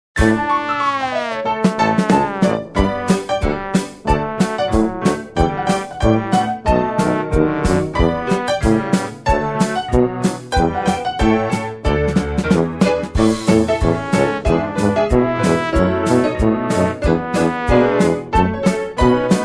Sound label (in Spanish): comercial dixieland